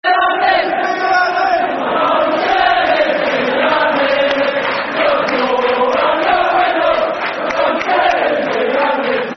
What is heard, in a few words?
Football Chant (Soccer Song); My Garden Shed; Sunderland Safc